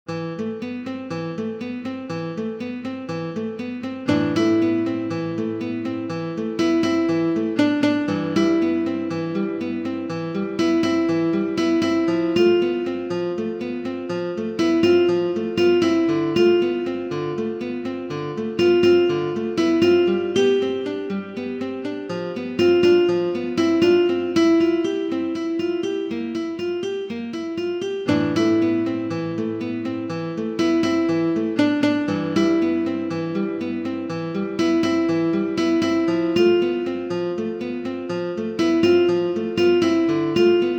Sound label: synth